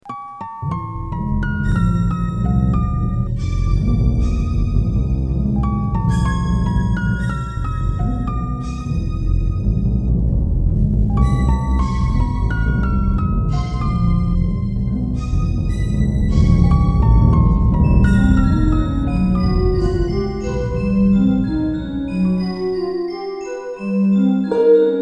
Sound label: spinning wheel; sleeping beauty